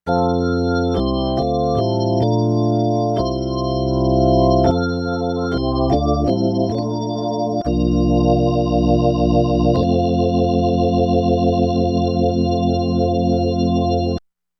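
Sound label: Org XS Master Chorus, organ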